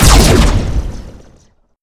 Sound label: booster shot, weapons